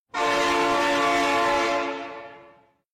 train sound musical

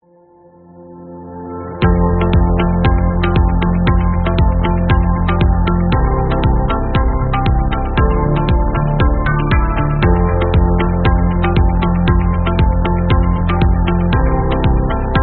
conversation music

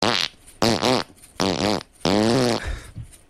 musical fart

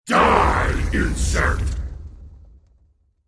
die insect

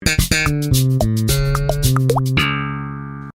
sitcom transition bass riff; seinfeld

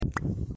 booooooooooooooooooooooooooooooooooooooooooom

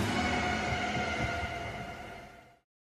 jumpscare; Arcade Craniacs

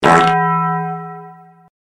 fart bong; taco bell

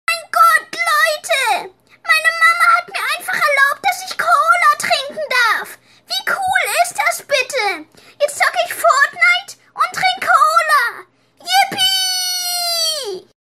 even more yippeeeeeeeeeeeeeeeeeeeeeeeeeeeeeeee

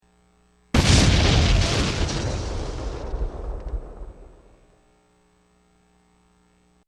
epic music video logo

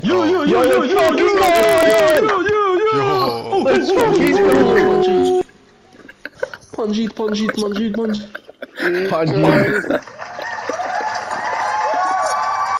demon chant

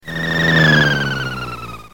going by; jetson

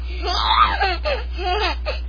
baby giggle

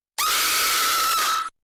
jumpscare scream

